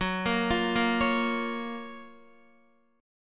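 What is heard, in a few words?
G flat Major 7th G flat Guitar; GbM7 arpeggiated; JGuitar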